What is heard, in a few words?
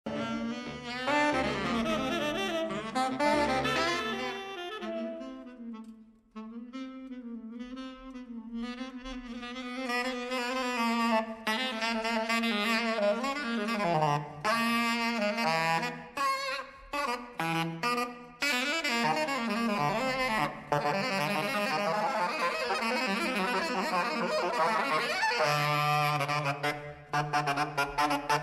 Jazz Sax Improv Live